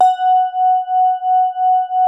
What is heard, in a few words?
SOFT F#4, synth pluck; Music Store - Claudius Diemeter Schwerlos